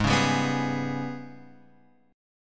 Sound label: Ab Minor Major 7th Double Flat 5th, Guitar, Open F, AbmM7bb5 chord; strummed